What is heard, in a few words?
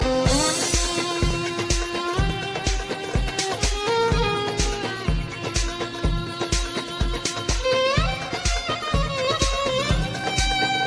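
instrumental, ringtone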